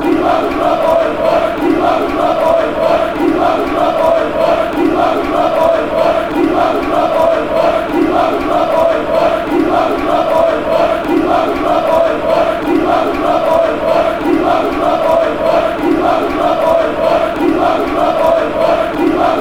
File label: move up boy, chants